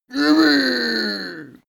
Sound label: male cheer yippieh